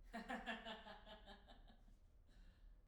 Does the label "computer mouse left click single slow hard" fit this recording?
No